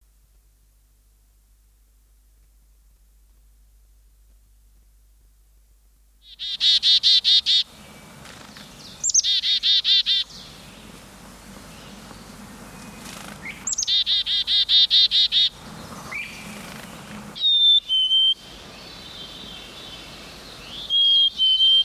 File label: black capped chickadee, songbirds